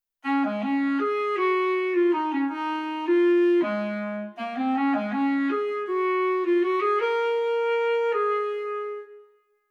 longtone

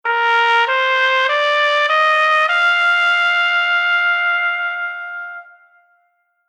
Horn Growl

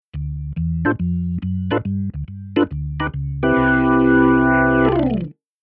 Keyboard Organ Perc

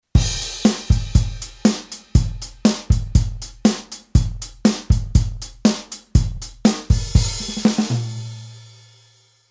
Rhythm Kit Pop

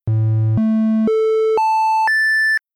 Synth Osc Tri

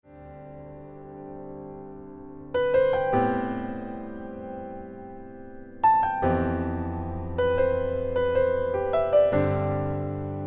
Future Bass Sketch Melo Chord

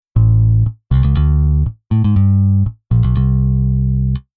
Bass

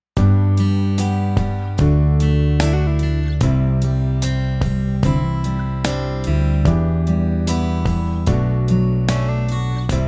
guitar

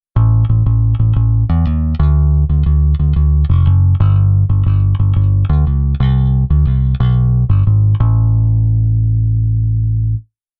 Bass Swing 20

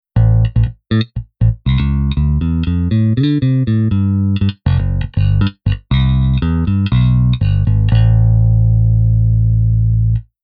Bass Cabi

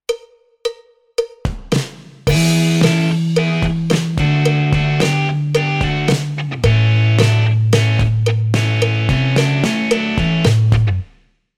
guitar pattern

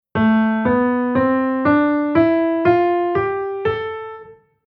Chord Scale Natural Min

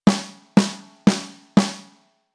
Rock SD, Rhythm

